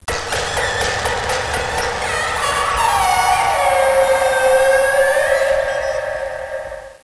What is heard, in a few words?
creepy; svencoop